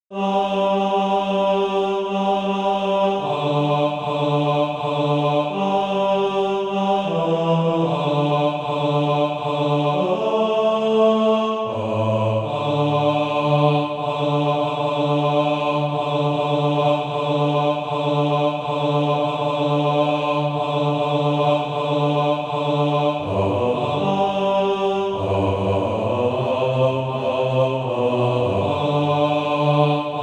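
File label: bass, SATB Practise Music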